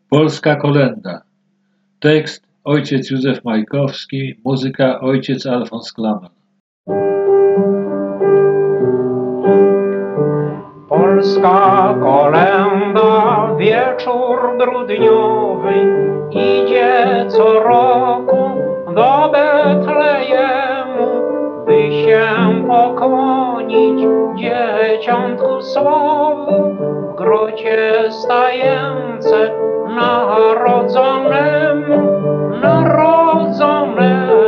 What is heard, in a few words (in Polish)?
Polska kolęda; koledy